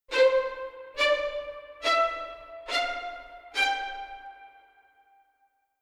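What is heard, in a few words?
Strings Spiccato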